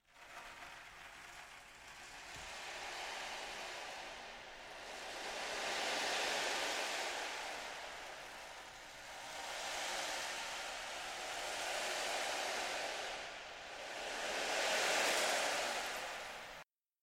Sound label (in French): Tambour ocean